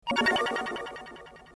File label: epiano sample; djtyro